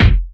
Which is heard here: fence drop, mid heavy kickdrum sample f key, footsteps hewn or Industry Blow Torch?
mid heavy kickdrum sample f key